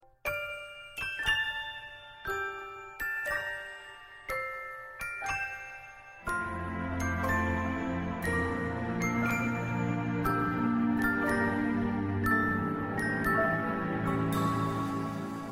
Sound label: good night tone